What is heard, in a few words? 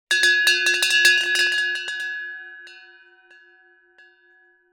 BELL HAND